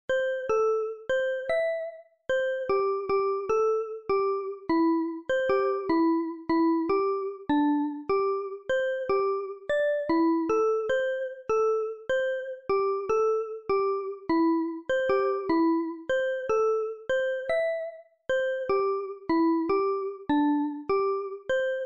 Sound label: Credits